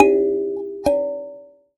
Finger, Prepared Kit; Battery 3 Sample Kits

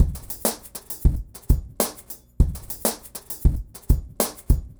Remixed Drums 100BPM; The Roots Drumloops

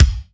BD, Bassdrums, Roland TD-20

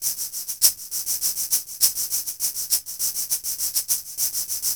Shaker Echo; Ambient; Sonic Foundry (Sony Creative Software); Ambient Atmospheres & Rhythms

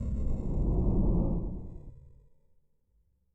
Creature; Yamaha DTXpress-II

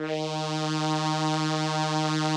SOLINA, String Machines

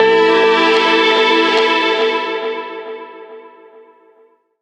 RAW STRING; Rawcutz; Nu Forms

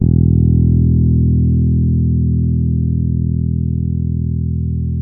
bass, E 2; Spectrasonics; Bass Legends CD 1

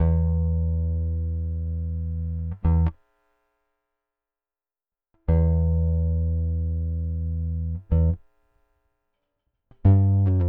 Rock, Bass; Bass X

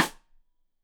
Side Stick Stereo; Deeply Sampled Drums

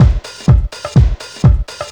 dull thump, Deep House 125bpm

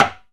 LO TMB CLS, Timbales; E-MU Producer Series Vol. 2 – More Studio Essentials; Composer; Timbales Xswitch

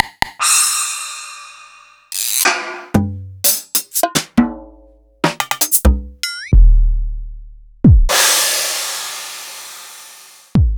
EMU Orbit

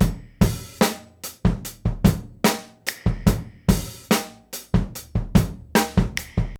LOOP, Groove Activator; AMG Gota Yashiki

